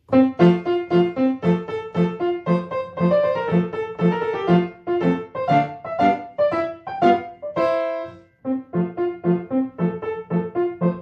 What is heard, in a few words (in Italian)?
Scozzesi per orchestra riduzione per pianoforte